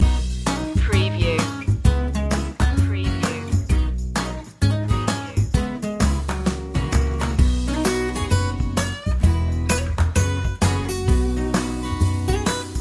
i feel alright loop

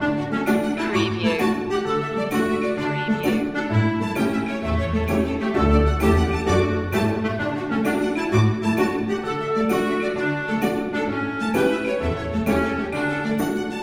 playground of happiness loop

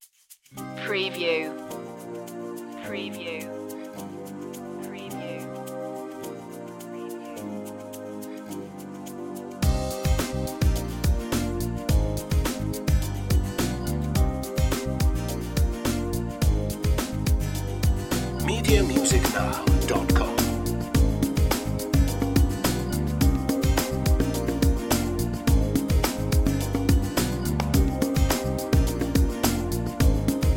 latin nights underscore

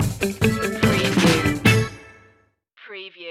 give me one time stinger

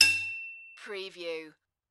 Hit Metal Pipe; lynne publishing

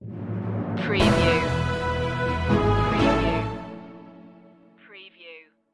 european anthem ode to stinger

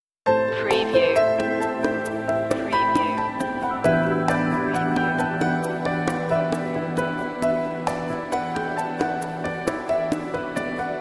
cafe vibes

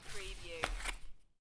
Playing Cards Gather Cards From Table; Casino / Poker, Cards & Dice

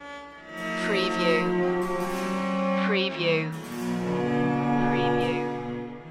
baroque loop

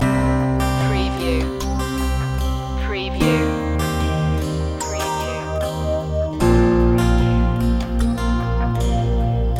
natural flow loop

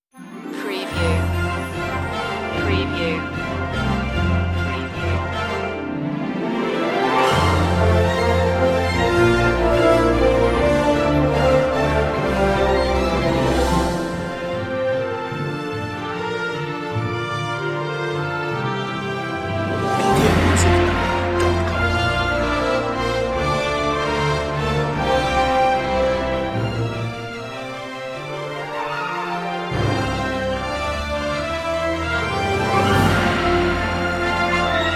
no choir

